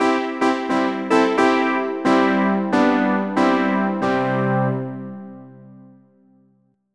fort; Raiders; 501 Great Games

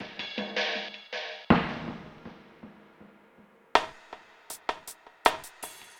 DRUMLOOP, AMBNT, 160; eJay; Ambient

